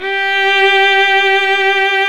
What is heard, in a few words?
STR VL G 5, STRINGS; Roland - LA Composer vol. 1